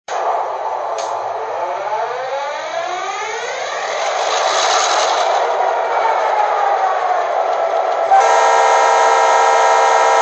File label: GP38-2, electric trains